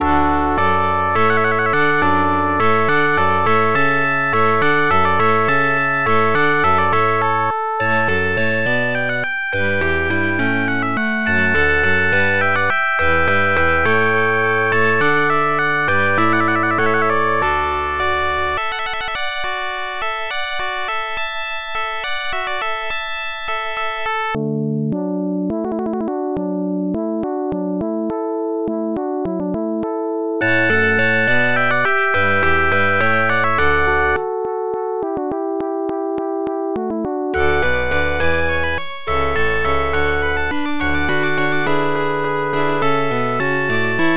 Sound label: Alla Hornpipe, music synthesizer card; AdLib; IBM PC XT AT; Georg Friedrich Haendel, Water Music Suite in D major, II